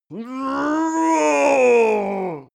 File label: male die growl; soundpool